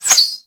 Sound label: Arrow Whizzby; Warframe; Bullet Impacts; Whizzbys